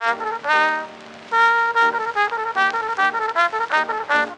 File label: Carnival of Venice variations; loc-edison